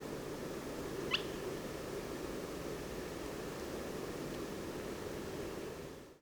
spotted crake; Comino

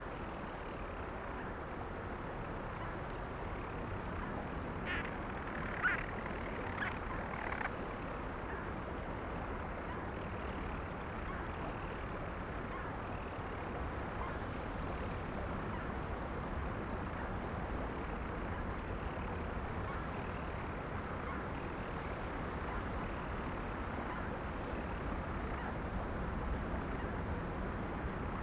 purr flight

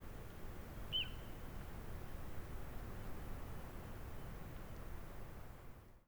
shorter

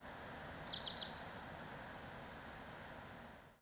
Wood Sandpiper

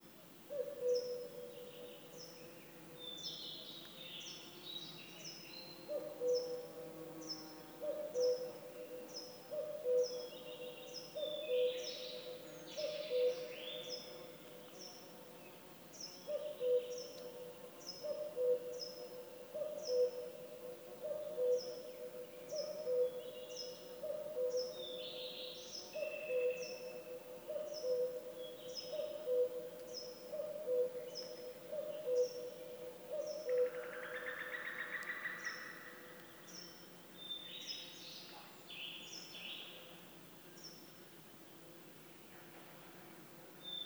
woodland song

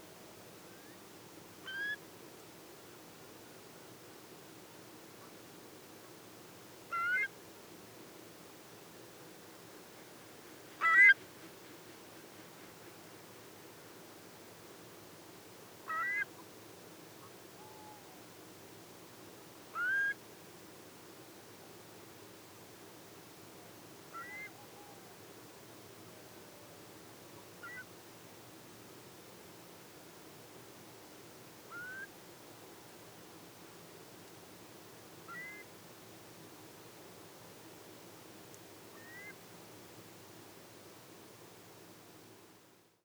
long low

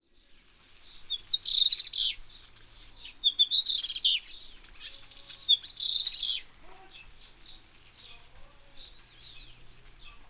Phoenicurus ochruros

